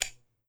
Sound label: lighter, tools